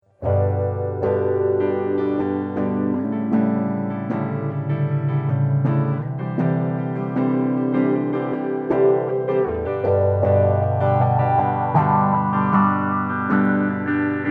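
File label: three quarter depth three quarter feedback guitar, phase shifter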